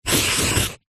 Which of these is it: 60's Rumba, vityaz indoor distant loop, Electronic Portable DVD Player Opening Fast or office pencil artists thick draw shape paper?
office pencil artists thick draw shape paper